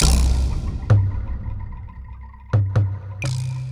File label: Percussion Combos; M-Audio; Pro Sessions VOL-38; Abstract World Fusion 2